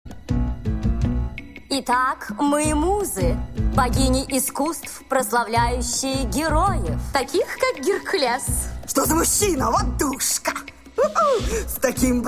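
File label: Russian; Hercules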